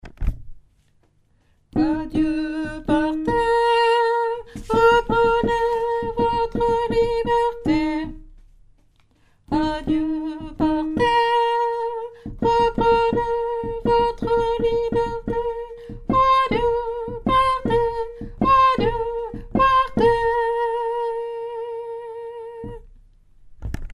saltimbanques basse

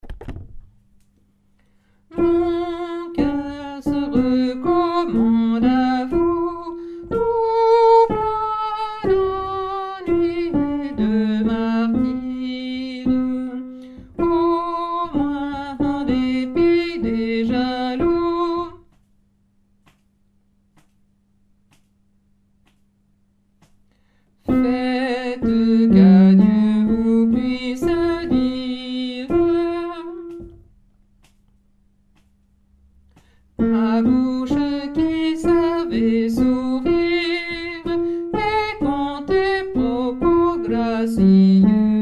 mon coeur basse

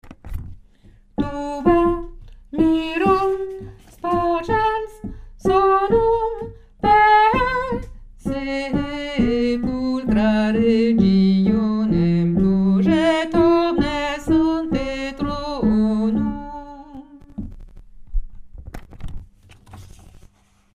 numero 2 lettre D basse

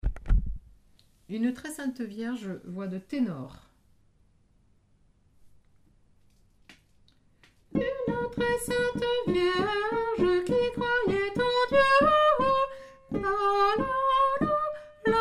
ténor; chants noel; une tres sainte vierge

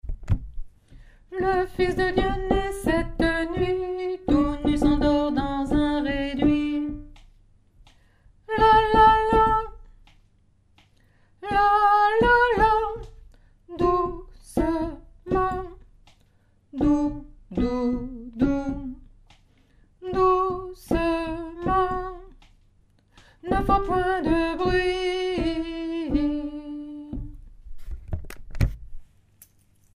l'enfant dort alto; nouveaux noels; chants noel

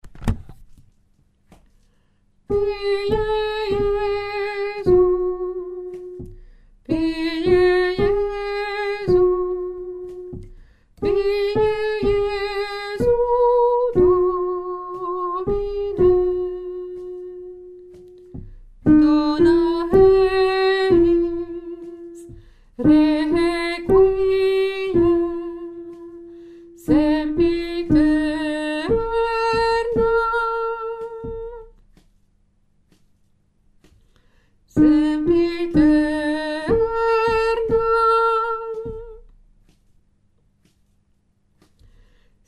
lettre A soprano, chorale

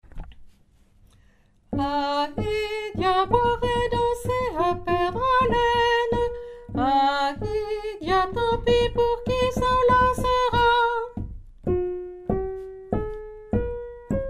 chanson villageoise tenor, chorale

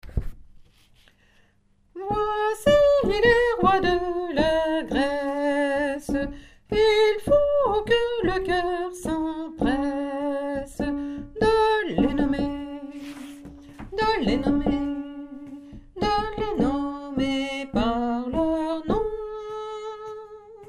basse, chorale

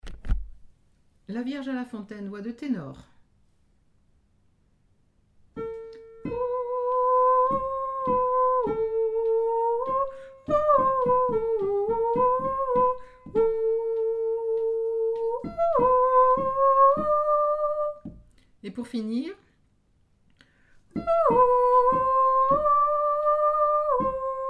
vierge a la fontaine tenor, chants noel

